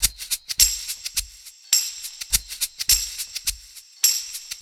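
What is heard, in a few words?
percussion, Roots Funk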